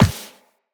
Walk Default, Footsteps; Operator

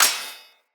Short Sword Thrown Impact Armour

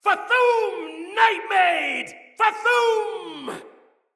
Cry, Soldier Male, Battle; Dendrit

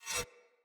Saw Dagger Whoosh Accent, Weapons Swords; Player

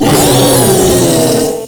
Proto Infested Ancient Vocal Attack; Warframe

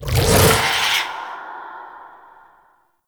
Noctua Alt Shot Whispers

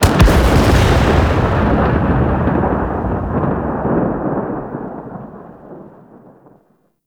Archwing Space Explosion Close; Warframe

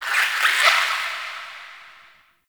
Critter Squeak; Warframe; Ambience; Nokko Colony

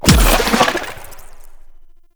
Infested Arm Cannon Secondary Shot; Weapons

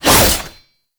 Shield And Sword Whoosh

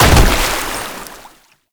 Land Heavy Water; Movement; Warframe; Uriel

